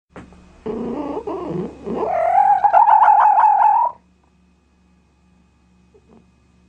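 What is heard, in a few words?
lisa golos, fox voice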